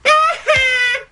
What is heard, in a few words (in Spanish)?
risa; nelson